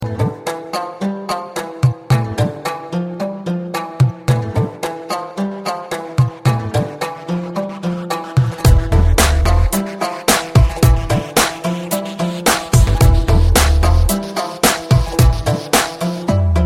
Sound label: pashto music; ringtone